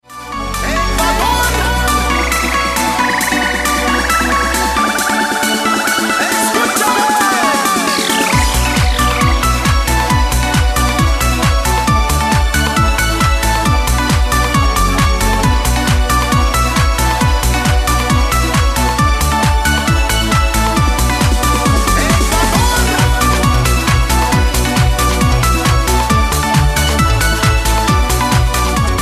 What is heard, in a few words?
trance